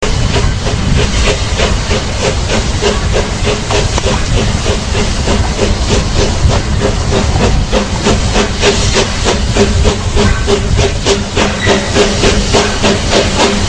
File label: steam Locomotive; SuperTuxKart